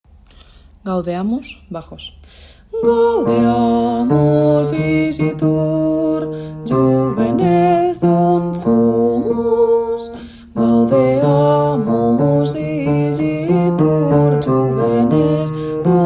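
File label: bass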